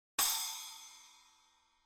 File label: splash